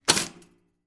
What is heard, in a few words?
household toaster pop up